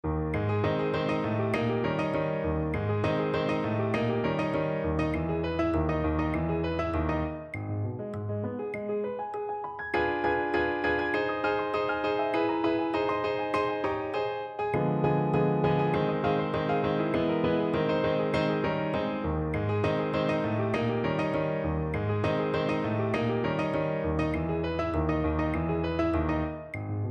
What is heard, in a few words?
audio click